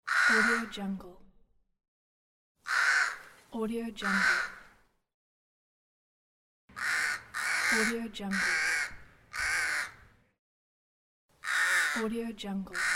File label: crow; tibasound